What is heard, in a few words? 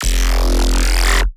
Neuro Bass